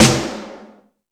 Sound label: Stadium Rox, Drum Machines